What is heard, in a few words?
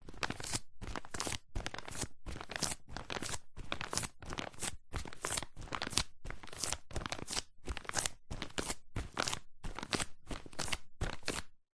foley footsteps barefoot walking plastic sheet softly